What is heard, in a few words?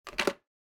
phone pick up